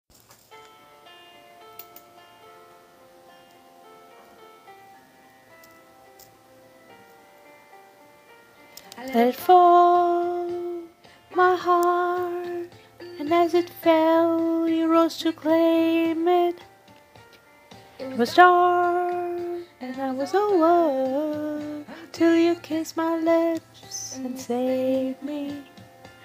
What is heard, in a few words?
Vocals